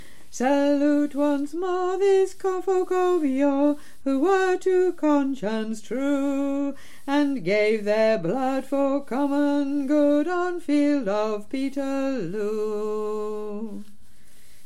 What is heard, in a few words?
Chorus Alto